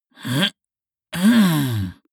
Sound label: male harrumph angry; menschen; koerpergeraeusche; soundpool